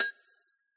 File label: agogo Low, 505; Tone.js